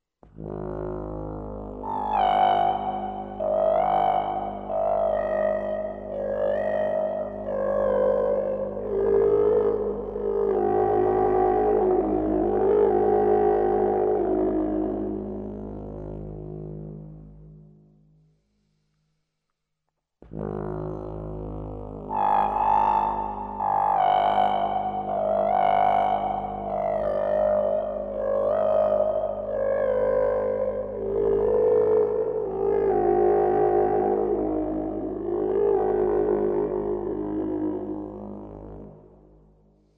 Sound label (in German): Tubasolo